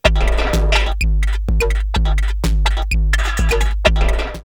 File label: Testtone 126, drumloops, Rave; X-Static Goldmine; 90 s Sample CDs